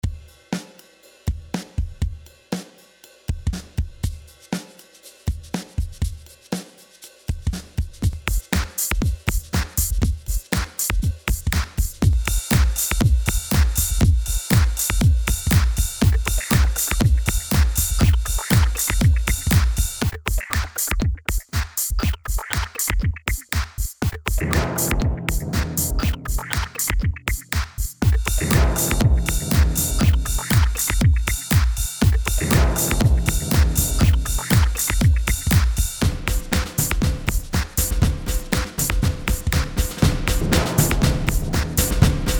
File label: percu